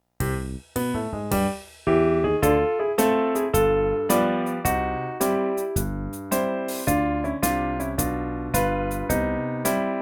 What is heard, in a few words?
karaoke